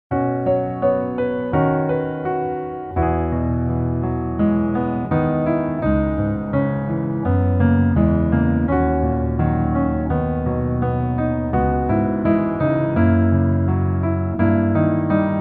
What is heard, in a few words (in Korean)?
Piano ver 멜로디MR -1키